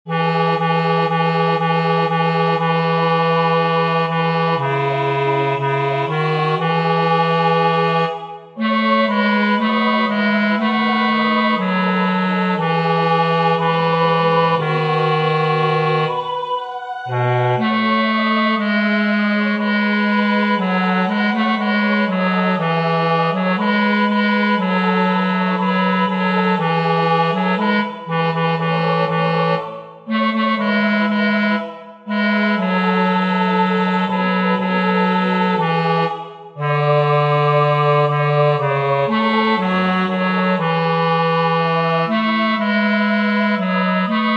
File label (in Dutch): Israelisch gebed Tenor